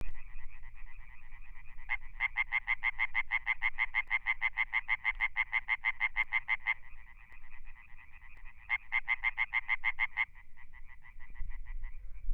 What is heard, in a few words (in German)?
Laubfrosch Ruf